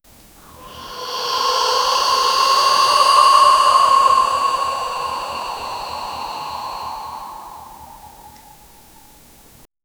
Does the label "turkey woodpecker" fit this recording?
No